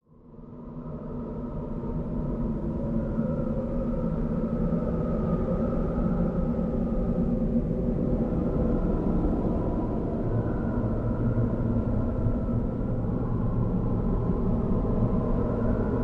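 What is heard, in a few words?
Spirit Wind Desolate, ghosts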